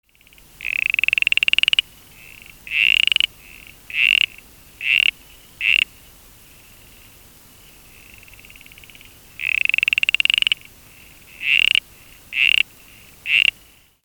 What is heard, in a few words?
laevis, frogs, geocrinia